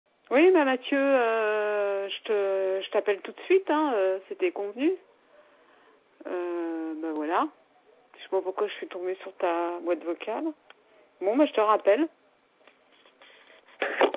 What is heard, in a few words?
evil mummy